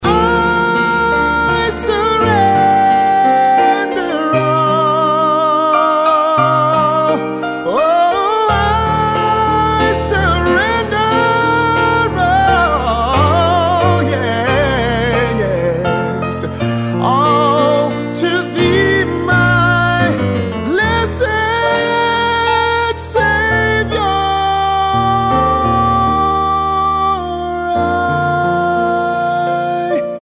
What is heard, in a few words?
ring tone